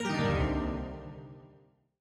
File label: Error